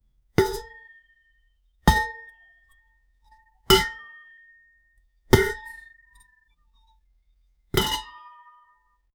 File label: thin cooking pot lid open